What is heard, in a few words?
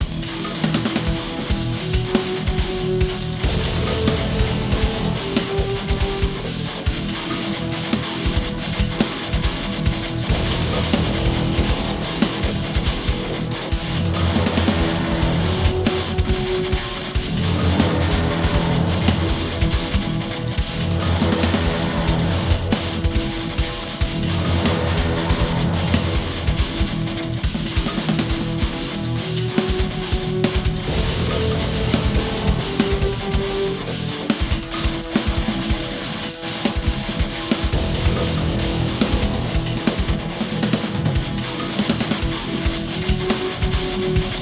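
Music Battle Loop; Vortex Ridge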